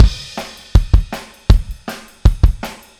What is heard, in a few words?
Kick, Drums, 162 BPM; Take Your Toms Off